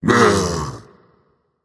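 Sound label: tongue, non-verbal; smite; voicepack; merlin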